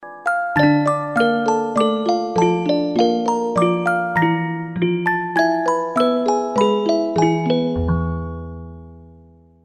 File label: Inn Music